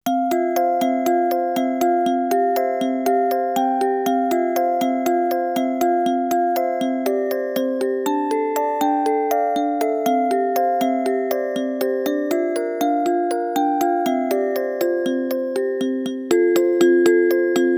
Music Box Mix; we are original drama original sound tracks 2006

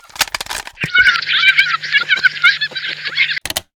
Recording Device

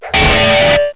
Bat hit Guitar; Rabbids Big Bang; Android iOS Windows gamerip 2013

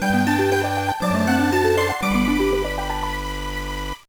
Jingle, soundtrack; Tantei Gakuen Q Kyuukyoku Trick ni Idome 2004 GBA

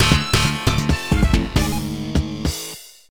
Hero Dies (City)

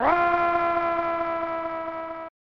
scream; SNES

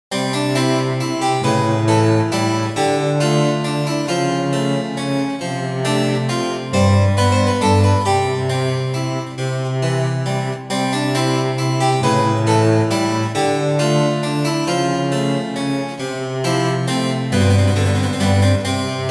Baroque; uncharted waters 30th anniversary complete original soundtrack 2021

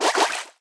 Se Fish Hit

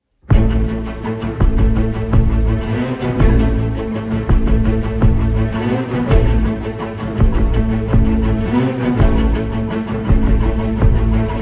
Cinematic; Get Even

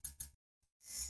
lights flicker on; Hellpoint

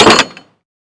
Sound GUI Show Hide Menues

